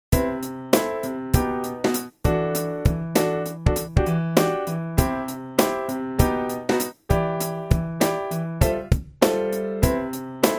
song 8beat

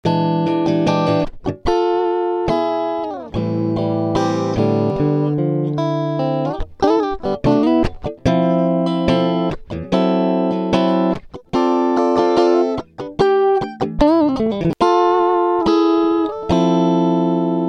guitar

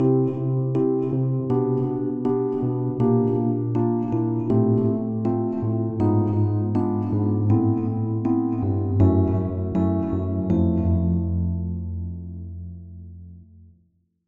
pre delay 200 mix 40

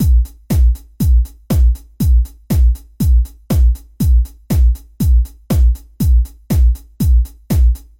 kick

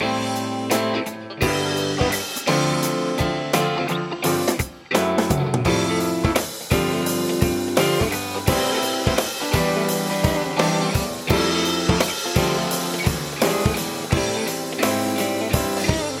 start guitar

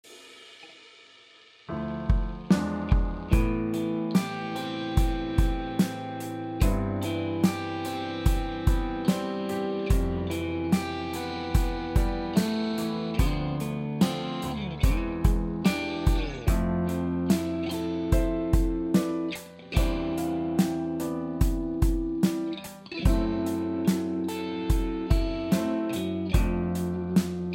ballade guitar

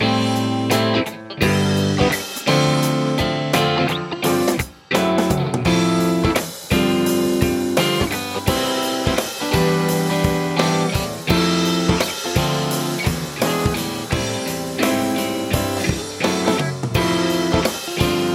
start guitar

